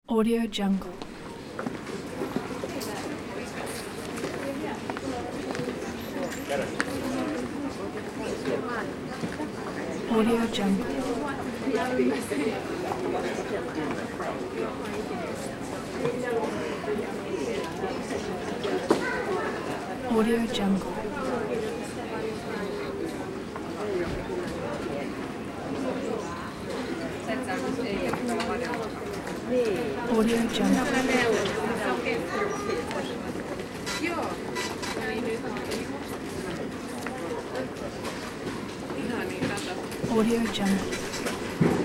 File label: book store ambience with light voices